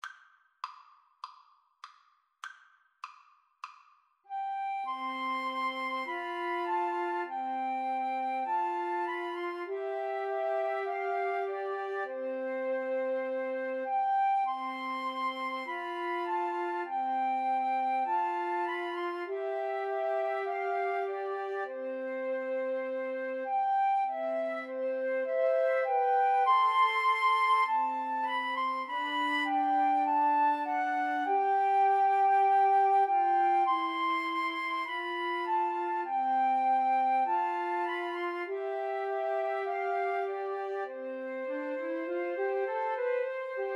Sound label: Flute Trio